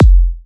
Techno Kick

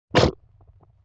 Mouth Hit; Vintage Cartoon; Comedy Vocals

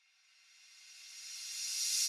Reverse FX

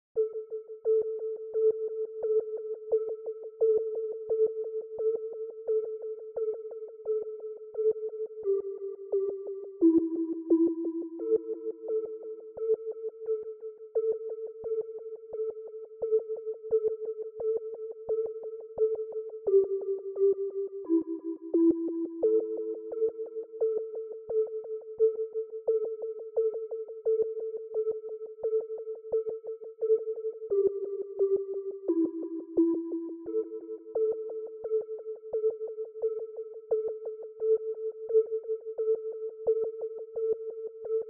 174 BPM A Min Pluck, DnB; Melodies; Loop Stems; Axis

